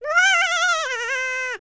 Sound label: BLACK SEA, World Voices; ILIO; Vocal Planet VOL-5